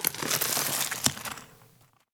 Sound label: med rock rustle gravel; 3 Leaf Productions - Audio Pack; rock-wood sfx(mini pack)